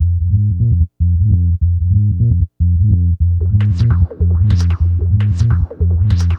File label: Hardcore Breakbeat, 150 Fmin; Big Fish Audio; Hardcore Breakbeat Action; Trukin